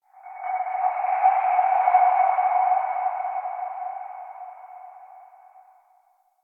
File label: Texture One H, Ambience; Warframe; RailJack